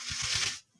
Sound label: clean